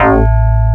MARIMBA

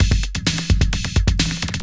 drum 130 electro, drumloops

